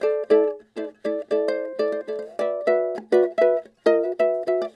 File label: LOOP Ukulele Stereo